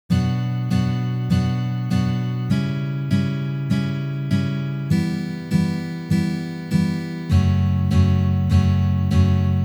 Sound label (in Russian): Uprazhneniya dlya igry akkorda Si minor na gitare